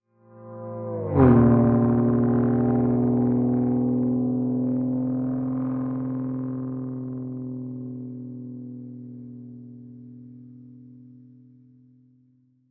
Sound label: Vrooooooom, semi horn sound, Steel Guitar